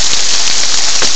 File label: RAIN; CMI Sound Library Rev 1.3